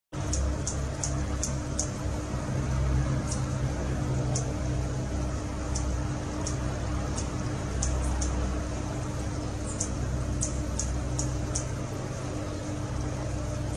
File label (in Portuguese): Canto do beija flor tesoura verde